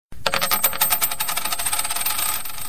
Funny Coin

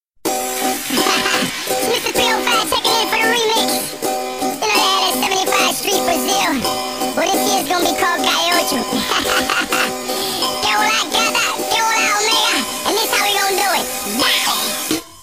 Chipmunk

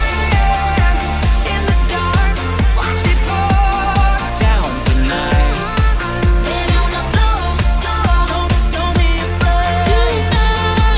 Mega Pop Mashup

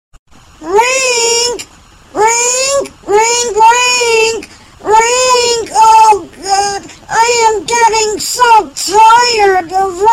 Tired Kid

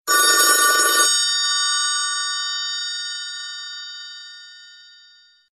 Old Phone Real Ring

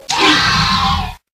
Velociraptor

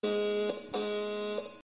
Tone Alert; APX

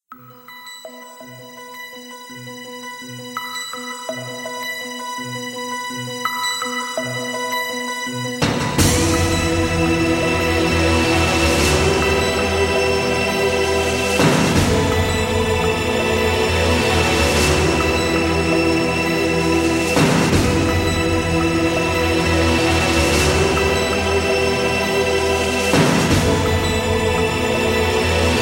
Music Menu; Mgs

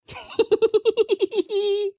giggles; genji

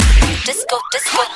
Disco Disco

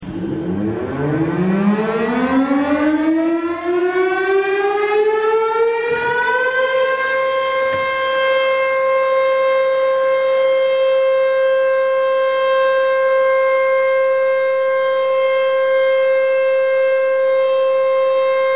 Raid Siren

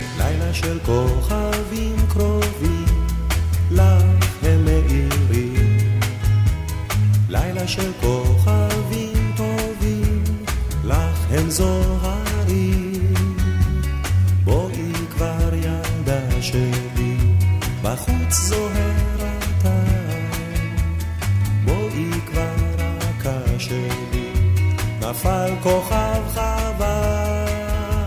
Romantic Song

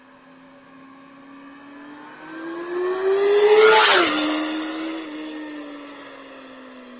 Yamaha Yzf-r6

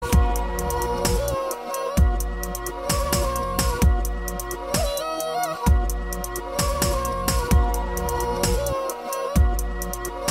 Beats

